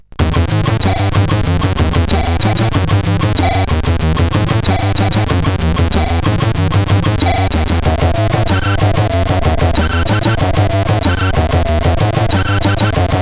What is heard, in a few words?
sid CSG8580R5; Dope Melody